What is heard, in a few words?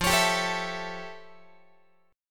F Augmented Major 9th F Guitar; F+M9; strummed